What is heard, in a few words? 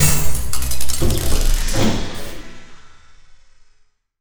interface new moves; Hellpoint